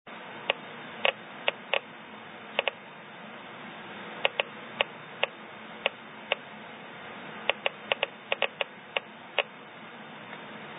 Thorite Kemp Uranium Mine Cardiff Township Ontario Canada 1 inch 10 sec gamma